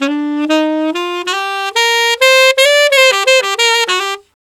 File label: Tenor in G min, Tenor Sax Loops; Sonic Foundry (Sony Creative Software); Jazz Solos and Sections